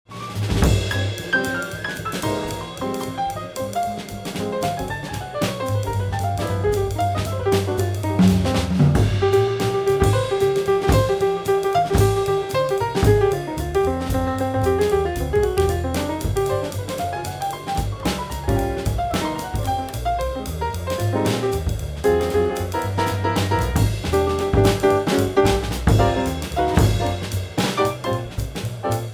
Jazz Trio All Tracks; v76 pre

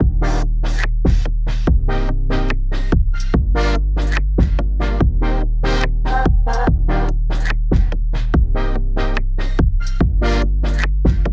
SEM Wet; filters bundle

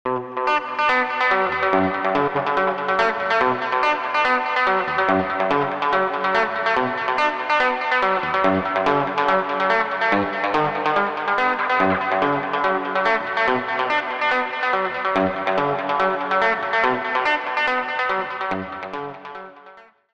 Guitar Wah Maker Dry; filters bundle

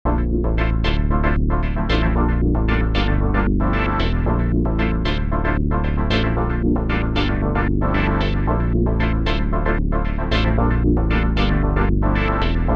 Mini Just a Step Sequencer Wet; filters bundle

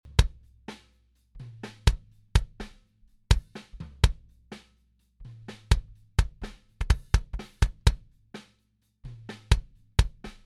Acoustic Kick Dry

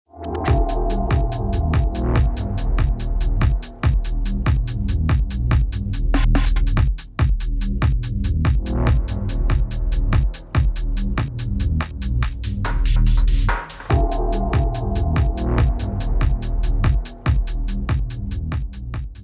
Kick and Tops Dry; filters bundle

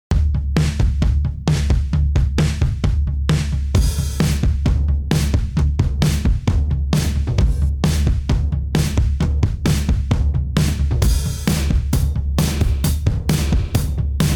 Drum Loop Dry

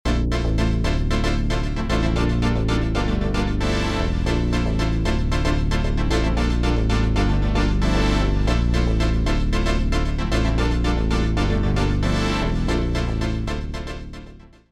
Mini Just a Step Sequencer Dry; filters bundle

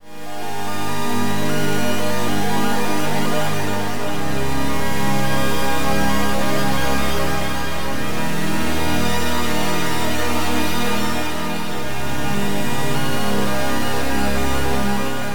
Disco House Dry; filters bundle

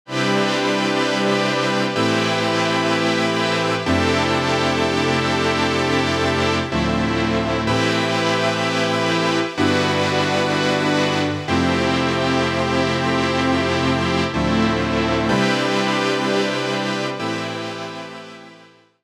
4 Bars Buildup Dry; filters bundle; SEM

